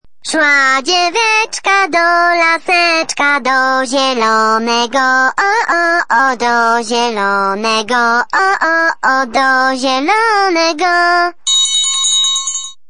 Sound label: Melody, RingTune